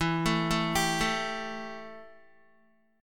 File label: E Minor Double Flat 5th, Guitar; 11,12,9,12,9,x; 4F,4A,5C,5G,5C,6E; arpeggiated; JGuitar